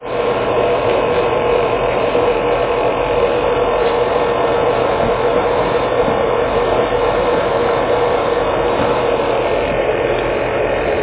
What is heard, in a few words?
Machinery Hum